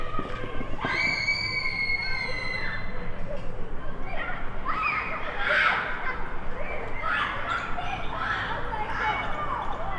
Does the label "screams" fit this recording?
Yes